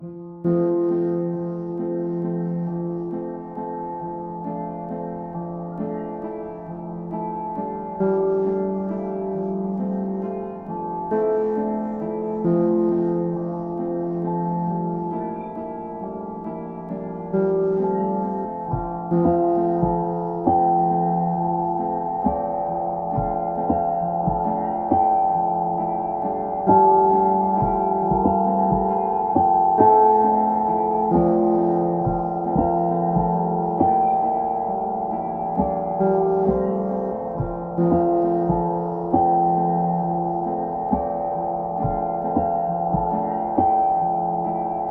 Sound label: temp piano